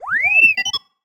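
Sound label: Sci-Fi Droid Robot Emote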